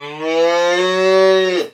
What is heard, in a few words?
moo message tone